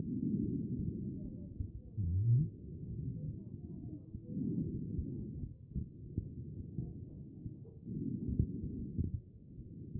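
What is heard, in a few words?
anterior chest, position 3; B MODE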